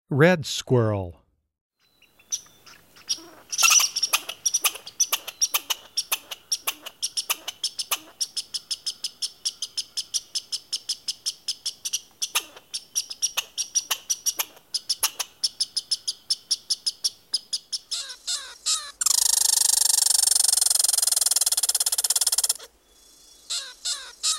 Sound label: Red Squirrel, Wildlife Sounds